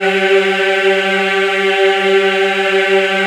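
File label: G3 men women eh, Choir; USB Soundscan vol.28; AKAI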